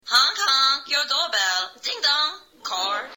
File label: cars, doorbells; SNL